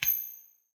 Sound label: coin flip; soundscrate